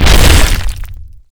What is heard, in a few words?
stone; morbus